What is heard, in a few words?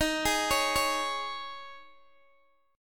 D sharp 7th Suspended 4th, Guitar, Open G; x,x,13,13,14,11